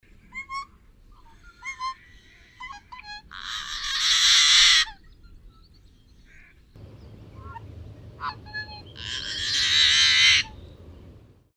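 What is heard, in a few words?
Blackbird Yellow Headed Accenting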